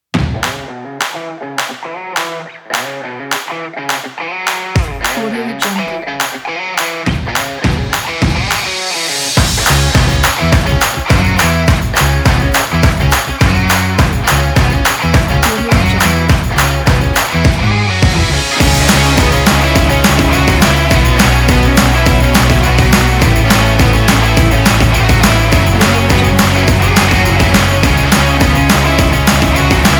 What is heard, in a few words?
happy music